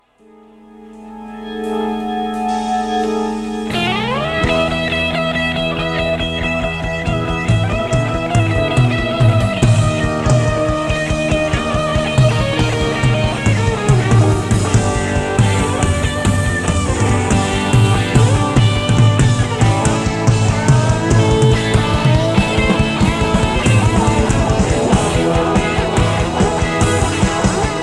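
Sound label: solo, guitar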